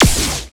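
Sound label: FG SHOTS, future gun; Total Control - Action Cutz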